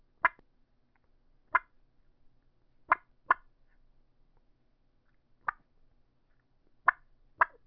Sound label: dynamite mouth call clucks, turkey; dixie